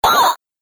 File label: Robot Droid Death, Robot Sound Effects